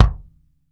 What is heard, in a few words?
THIN, KICK DRUM; Sampleheads; New York City Drumworks VOL-1